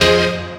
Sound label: Synth Oneshot Fm; Introcate